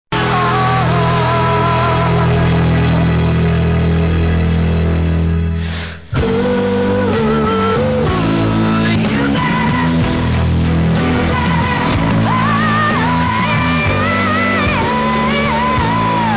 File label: 85 110 MHz